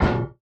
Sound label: metal chest inventory move; factorio 2.0.55